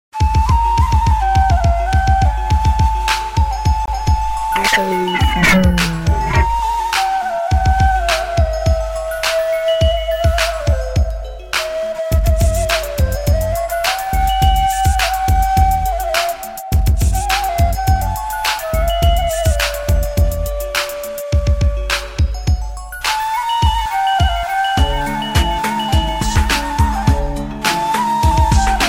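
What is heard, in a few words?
Flute Remix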